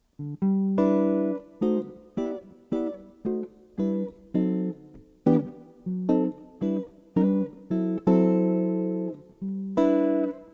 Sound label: 6th and dim, Jazz Chords; Ode To Joy and Mary Had a Little Lamb